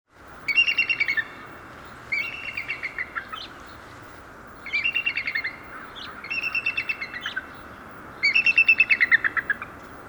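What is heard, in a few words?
bald eagle voice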